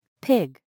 PIG

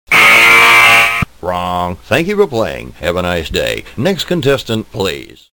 buzz wrong

